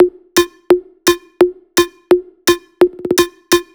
128BPM Air Raid Melody; VFH1 128BPM Air Raid Kit

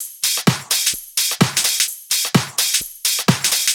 Houseloops 128BPM; Vengeance Samples

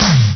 Tom, Percussion; Vengeance Samples

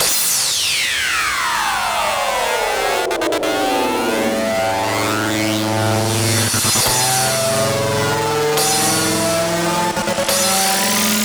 140BPM FX 8Bars; Vengeance Samples; Snares&FX Pairs

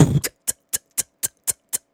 Beatbox Loops, Human Beatbox

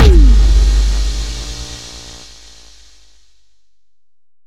Impacts

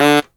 Synths D; Vengeance Samples

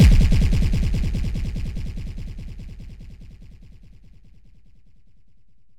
VFX2 Sub

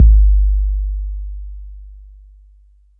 Bass Drop, 808; Street Anthems Sound Kit

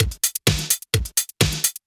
Housebeats, 128BPM; Vengeance Samples; VEH2 Loops

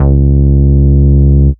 Vengeance Ultimate Bass C#, Root C#

